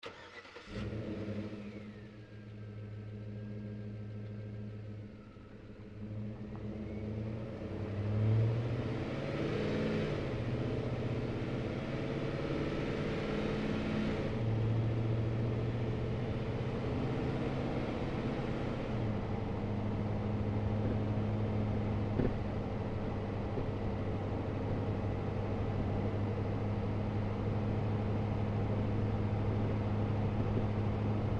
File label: Truck GMC Savana Onboard Drive Bumpy; 2010 GMC Savana; Trucks; Vehicles